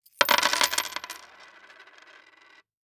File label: Coins Dropping On Wood Table; household